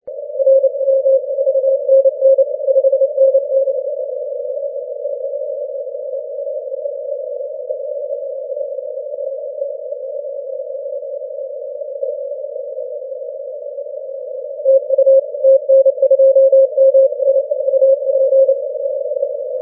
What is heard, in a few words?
17m cw